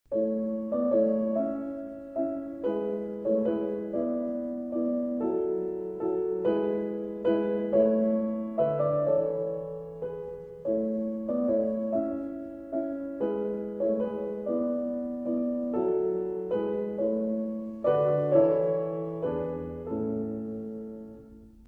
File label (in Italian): andante